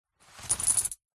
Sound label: foley money coins tip out of paper envelope into hand